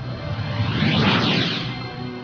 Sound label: model zoom; czechrepublic